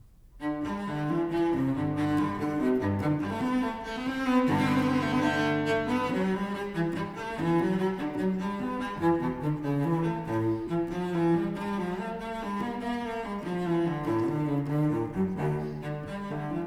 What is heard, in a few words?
Suite for Solo Cello No. 1 in G major Gigue; BWV 1007; BACH